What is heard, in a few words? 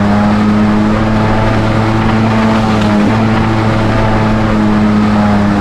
jetski throttle loop; hl2